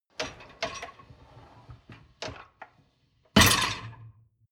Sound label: dishwasher lower tray draw in long